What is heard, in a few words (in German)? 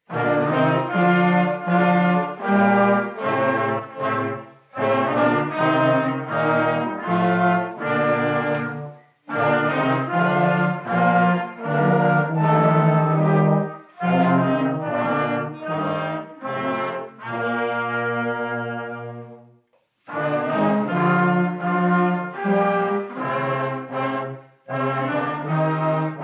Blaeser Schneefloeckchen